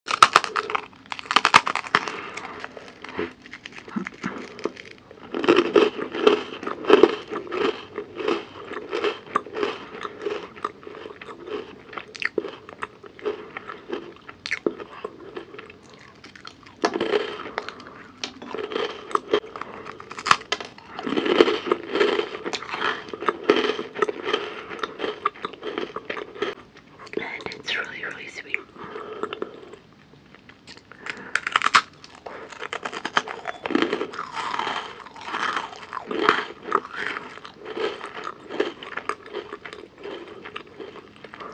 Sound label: Freeze-dried ice cream, خوردن بستنی خشک